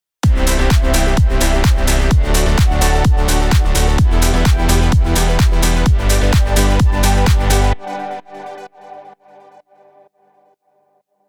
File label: Bass Drums